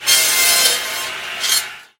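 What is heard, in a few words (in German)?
Kreissäge Sound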